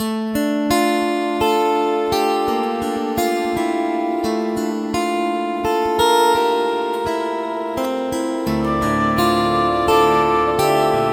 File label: Waltz; The Lost Legends of Redwall; The Scout Act III